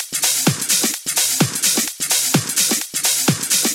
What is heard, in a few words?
House Loop, 128 BPM; Vengeance Samples